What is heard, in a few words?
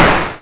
bang; 3DShooting